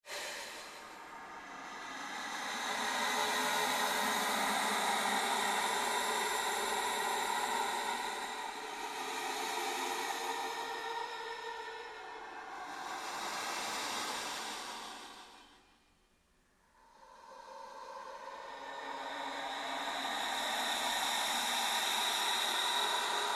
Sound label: Created Ambience Sweep Loop, Rise And Dive Sound Effects; Sound effects created by manipulating other sounds; Rises and Dives